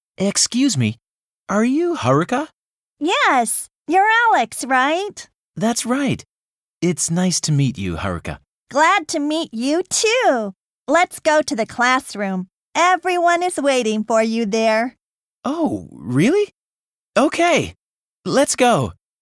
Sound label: harmony; Harmony English Logic and Expression Ⅰ; 新課程英語教科書 指導用音声CD